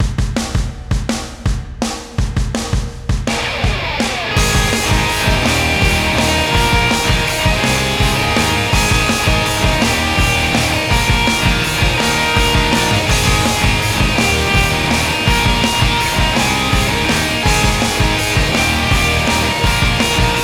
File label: Forgive (Instrumental)